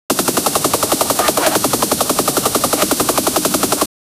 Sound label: production beats, dj effects